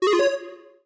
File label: button